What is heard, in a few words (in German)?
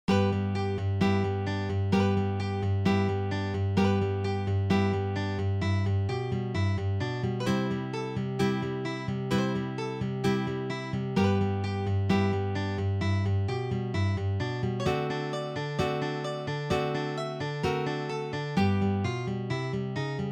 treppen blues